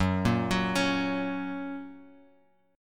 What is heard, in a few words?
Gb Major Gb down Guitar Open F; Gb arpeggiated; JGuitar